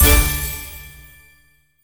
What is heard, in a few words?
bonus rise; pond5